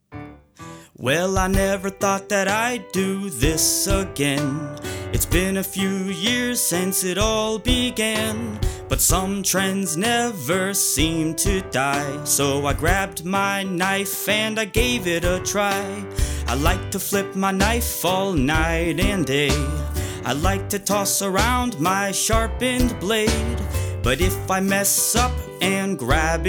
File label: The Knife Flip Song